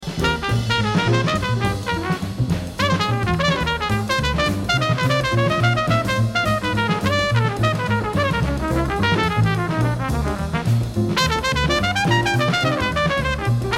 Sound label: Trumpet Solo